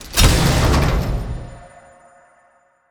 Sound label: case reveal rare; Counter Strike Model Pack; CS:GO; panorama